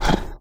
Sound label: wolf noise